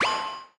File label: Level Up